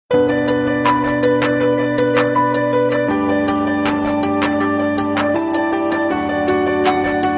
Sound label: Piano Solo